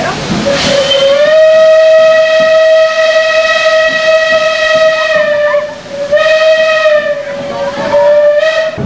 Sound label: whistle, railway